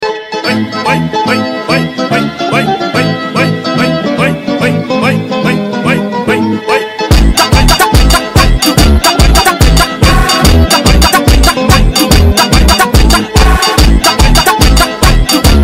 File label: Italian Funk